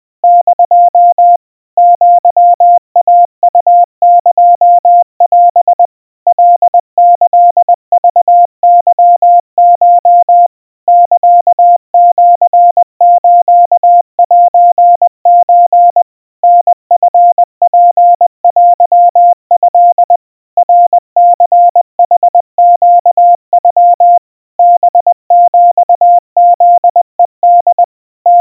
aiueo 70 cpm 700 Hz Crispy, Morse

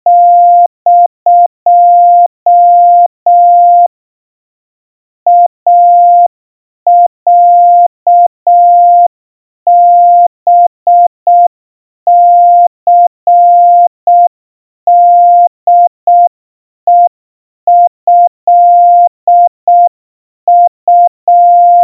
iroha 20 cpm 700 Hz Crispy, Morse

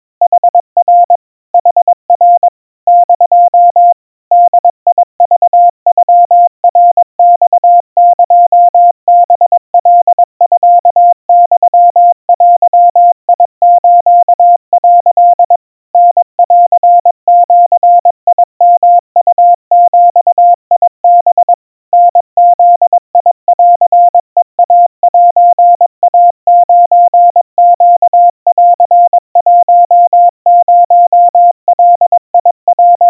kamyu 80 cpm 700 Hz Crispy, Morse

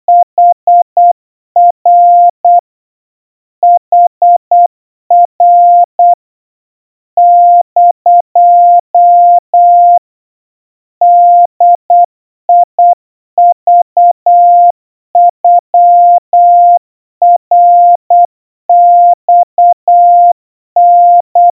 kamyu 30 cpm 700 Hz Crispy, Morse

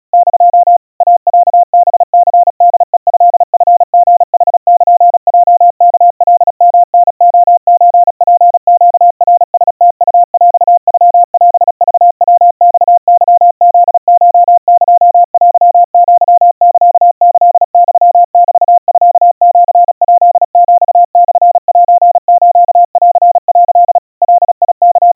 iroha 120 cpm 700 Hz, Morse

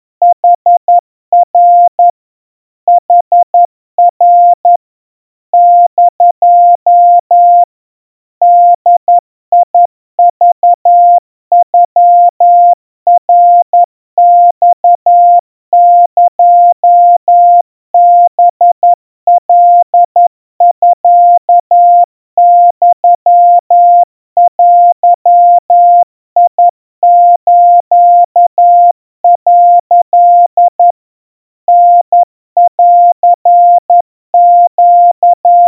kamyu 40 cpm 700 Hz Crispy, Morse

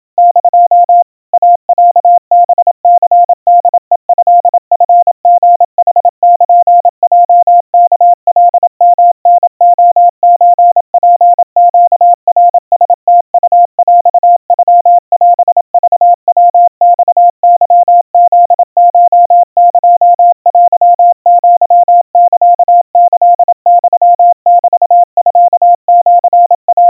iroha 90 cpm 700 Hz, Morse

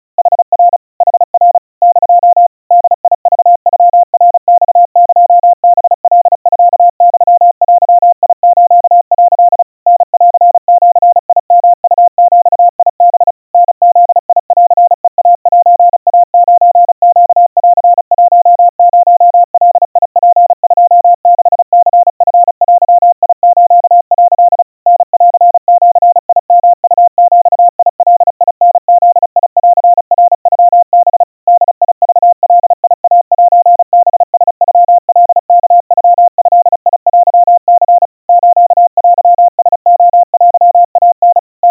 kamyu 130 cpm 700 Hz Crispy, Morse

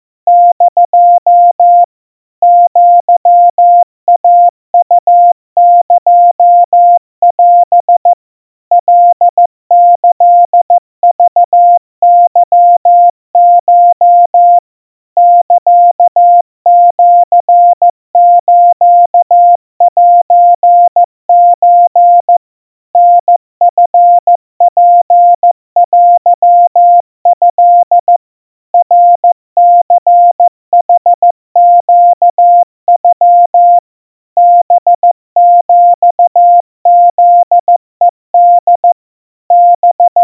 Morse 50 cpm 700 Hz Crispy; aiueo

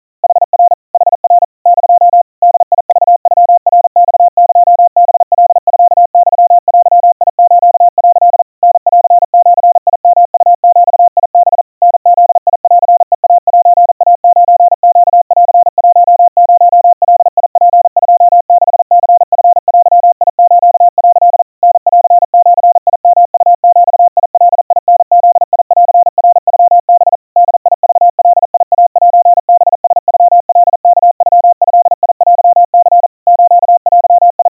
kamyu 150 cpm 700 Hz Crispy, Morse

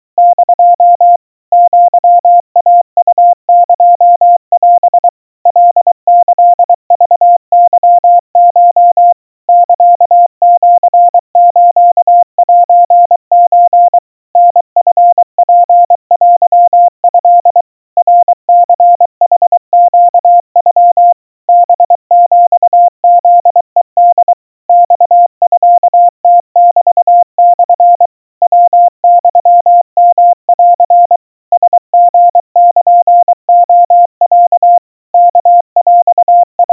aiueo 80 cpm 700 Hz, Morse

